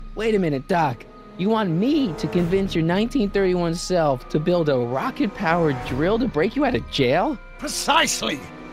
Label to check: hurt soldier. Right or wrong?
Wrong